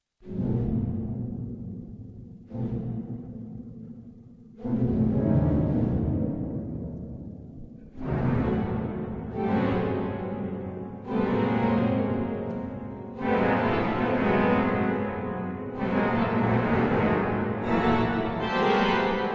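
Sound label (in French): impro, orgue; Improvisation n°3; Haikai; Organiste: Odile JUTTEN; prise de son: Jean-Marie LETILLOIS; epernay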